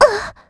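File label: Vox Damage, Korean; Estelle; kingsraid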